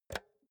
insert xlr multi socket hard; objekte; elektronik

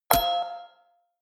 notification signal

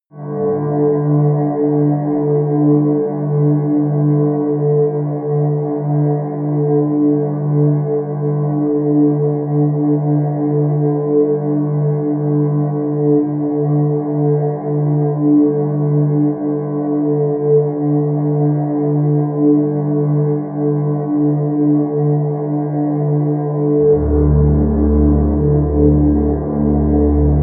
drone simple drone

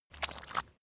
step mud slow normal

